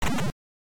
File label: Title Beeper; Hydrofool